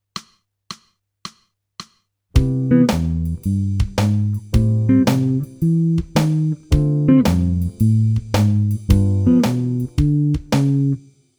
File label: jazz